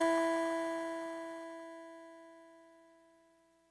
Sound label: Clavisynth E2, TX81Z; Electrophones